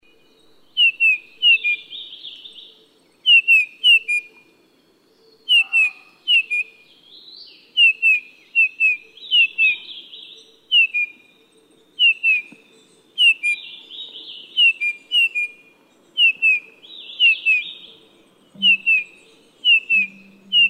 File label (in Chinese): 灰鷽; 灰頭灰雀、赤胸灰雀; 雀科/留鳥